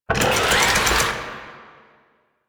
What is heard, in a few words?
Melee Slam Charge; Warframe; Necramech